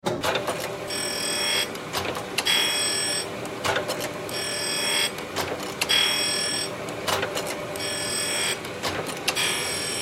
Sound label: Surface Grinder On Run Off; Grinders; Machines; Industry; Blastwave